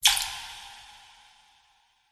nature water drip shallow splash large chamber cave reverb single